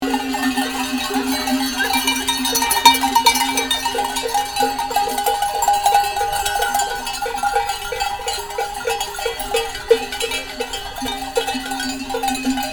Transumanza

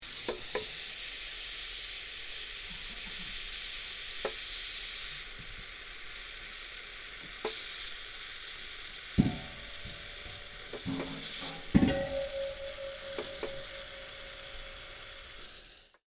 Bombola del Gas

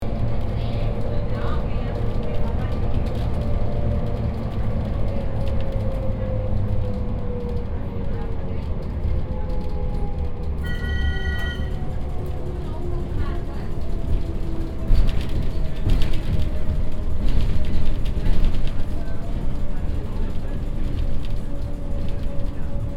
Cremagliera

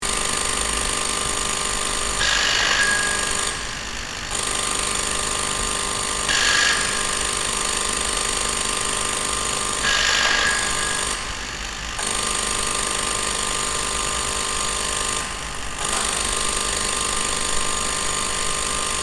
Filodiffusione

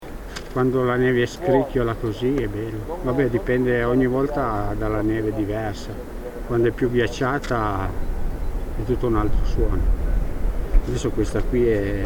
I suoni della neve

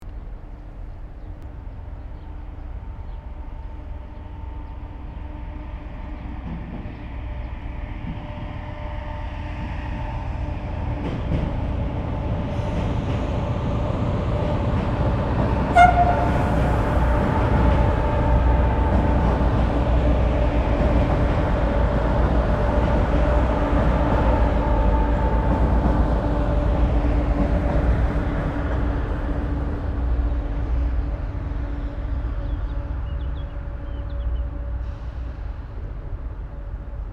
Il fischio del treno

